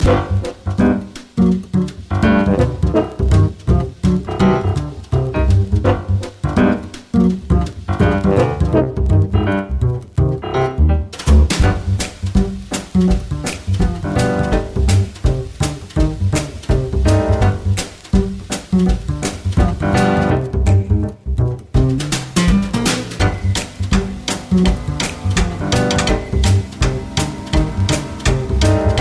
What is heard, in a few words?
best jazz